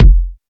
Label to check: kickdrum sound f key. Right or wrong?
Right